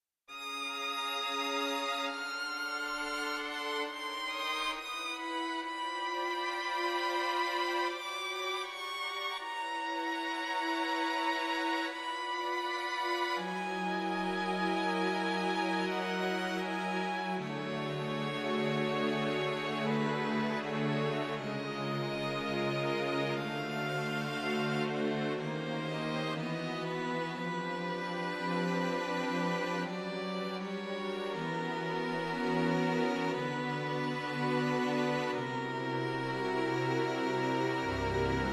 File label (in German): chromatische strings